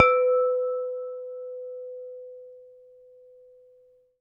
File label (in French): couvercle; In the kitchen